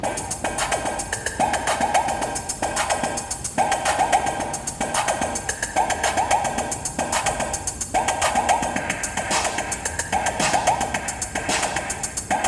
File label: Laptop Music; sons of the forest